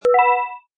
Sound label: buttons; Jungle Treasure